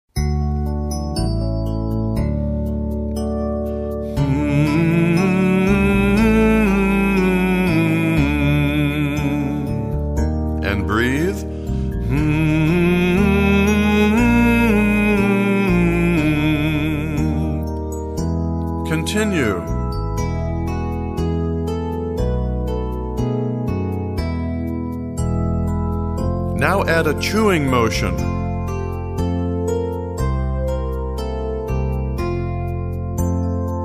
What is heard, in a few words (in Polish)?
cwiczenie warm up principles hum chew